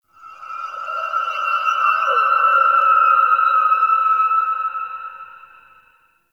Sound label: Exterior Organic Drones, Ambience; Warframe; Railjack